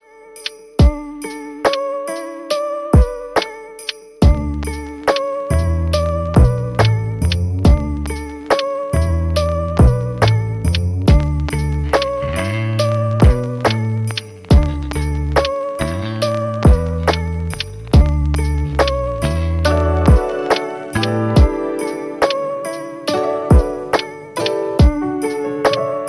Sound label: christmas party ringtone